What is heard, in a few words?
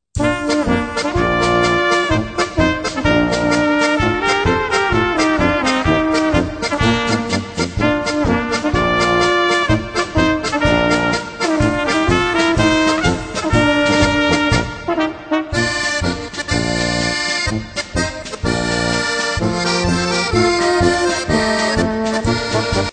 KELCANSKA INSTRUM POLKA